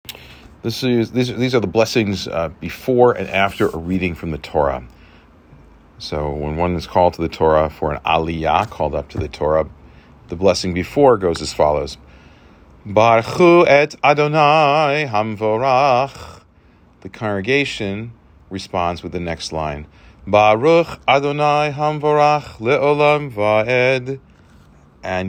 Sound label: Torah Blessings; Kadima